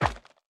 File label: Bounce Furniture